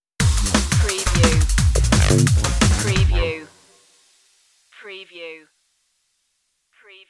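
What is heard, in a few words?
danger drum and bass stinger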